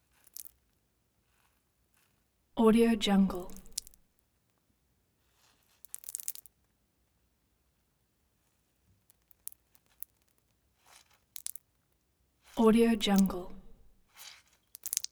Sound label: water or blood dribble